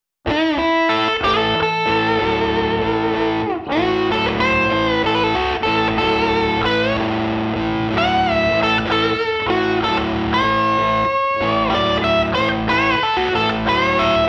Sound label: Bif Blues